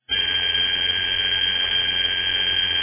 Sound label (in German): lancofon, armbandwecker